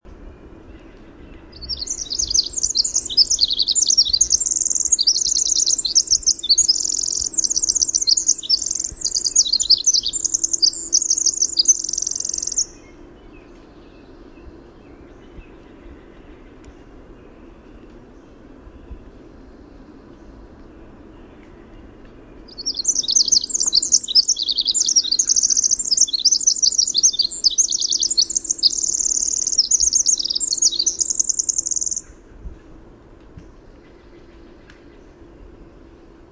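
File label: Winter Wren song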